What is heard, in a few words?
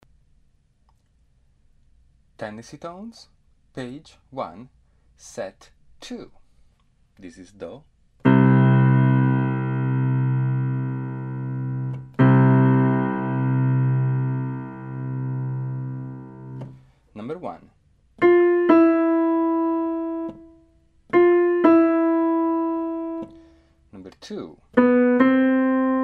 tendency tones